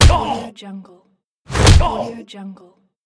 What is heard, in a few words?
punch in face